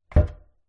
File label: household laundry chute wooden lid close light reverb from chute; zapsplat